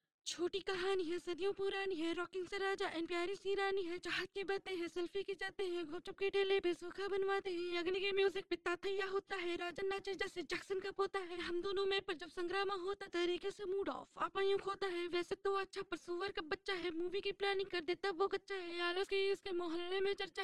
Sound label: Harmony